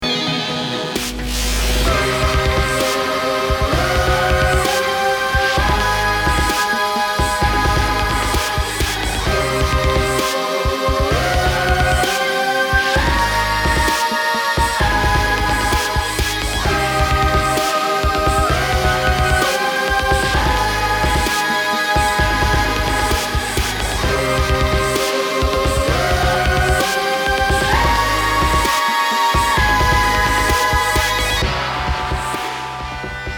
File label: VIDEO GAME; Aletar s Nightmare